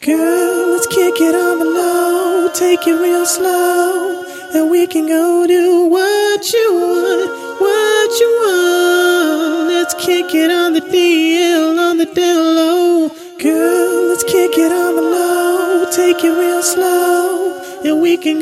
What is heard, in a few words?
acapella, RB 74 Bm